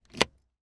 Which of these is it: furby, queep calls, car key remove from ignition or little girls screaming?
car key remove from ignition